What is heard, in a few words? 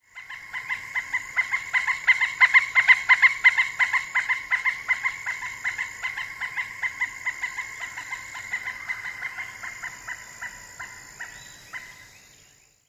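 flight call, pileated woodpecker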